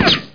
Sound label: RICOCHET; TNP demo